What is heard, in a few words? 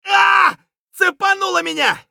scav wound; eft